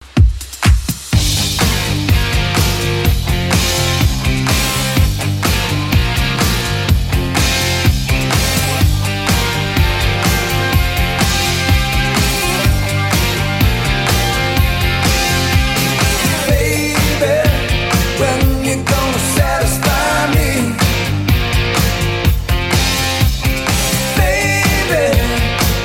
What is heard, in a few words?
80s Club 125 bpm CLEAN